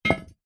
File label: metal heavy solid item set down on hard ground gently; zapsplat